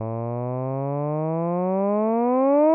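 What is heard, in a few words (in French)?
A, un "a" d'une voix de basse, variation de la fréquence fondamentale de 110 à 330 Hz, sans vibrato; AteliersHenrich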